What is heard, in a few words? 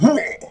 Boss Attack; Puna; Playable Tutorial Level; TR3